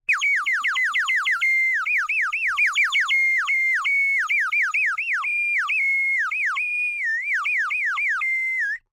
Birdwhistle, clay, painted, water, airy, wispy, phrase, long, explaning, water plops; Eiravaein Works; Vaeyan IV